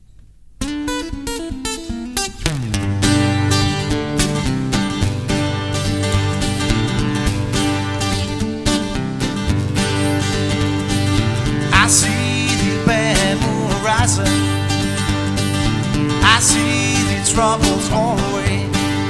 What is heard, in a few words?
Solo